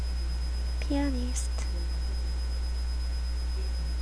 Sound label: pianist